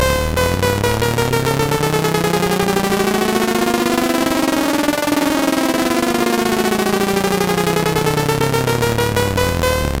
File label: PAN LFO SAW